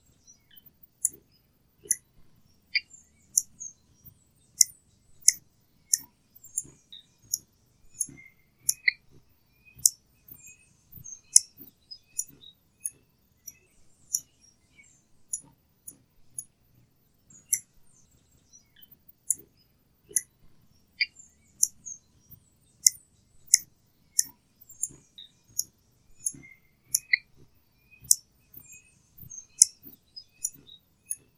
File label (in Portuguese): Canto do beija flor de bochecha azul